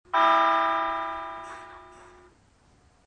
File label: subway horn; 電笛